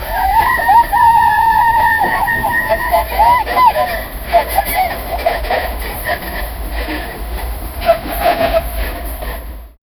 weihnahtskinderbahn dampf, trains

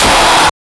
drei dolchste hintereinan

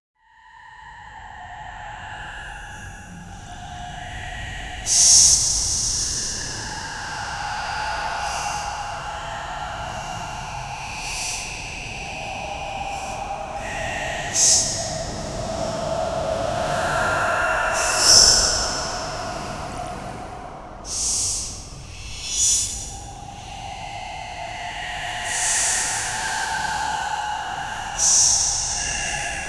angespannte horror, horror ambience

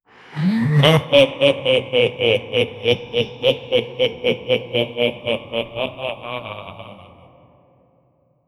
ein histerisches teuflisc, tunnel

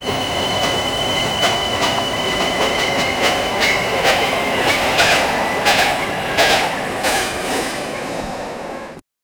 der dampflock des weihnac, holiday

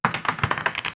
eine schachfigur bewegt, board game

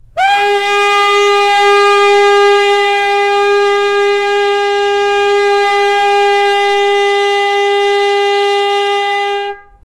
presslufthorn, air horn

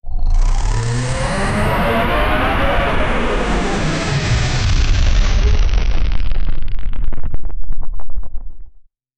starke elektrische entlad; time travel

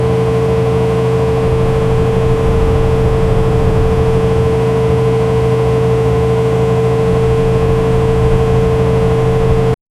ein amerikanischer lkw; american; SFX Engine

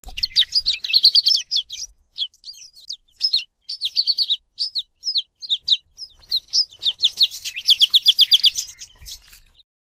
kleiner vogel zwitschert; animal

